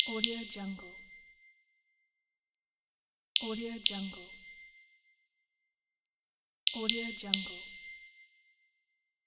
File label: the ding ding